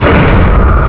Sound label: crack